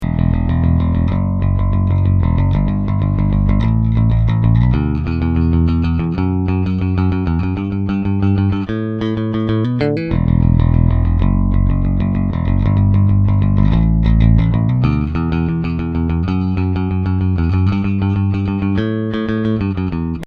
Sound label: pick